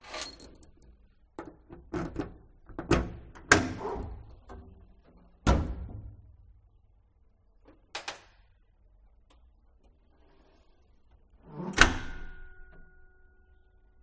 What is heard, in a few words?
picking up keys open close trunk; detunized